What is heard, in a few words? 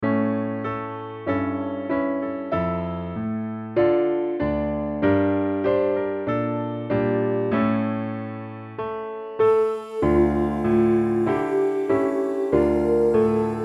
Piano Study F HIGH